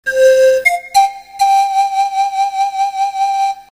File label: pan